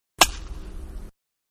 Baseball Softball Aluminum bat, Aluminum Bat Hitting Softball; Manhattan Production Music